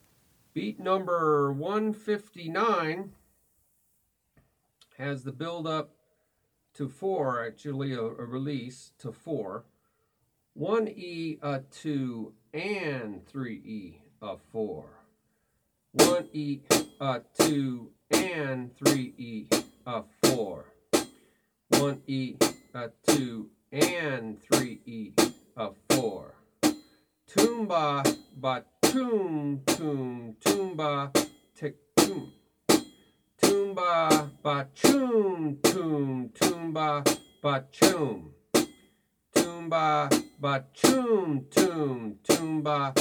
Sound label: Beat 1ea2 3ea4 ToomBaTiBa Choom Toom ToomBaTiBa Cha Ti